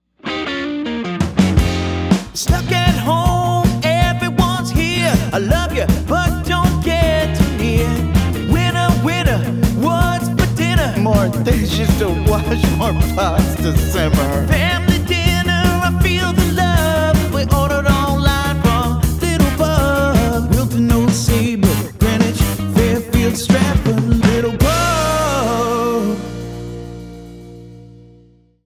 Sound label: jingle holiday; Little Pub